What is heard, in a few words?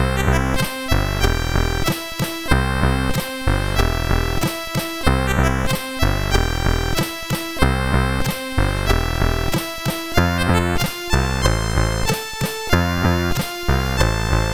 Blues, CSG8580R5